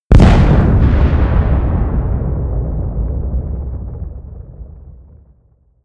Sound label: big explosion